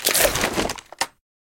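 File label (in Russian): выгрузка винтовки из служебного автомобиля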